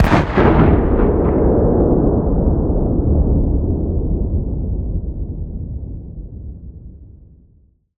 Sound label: shell trajectory, Tank; Bluezone; Explosion Sound Effects